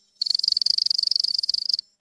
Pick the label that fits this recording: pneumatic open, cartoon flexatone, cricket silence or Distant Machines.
cricket silence